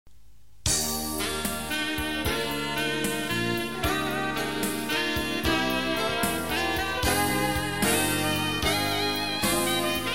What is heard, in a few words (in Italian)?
chitarra suona piu' piano